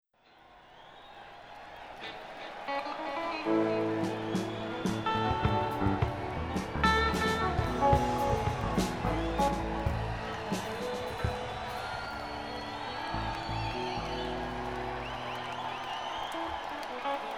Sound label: Tuning